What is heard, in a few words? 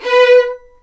B4, VLNS ARCO; East West; The Ultimate String Collection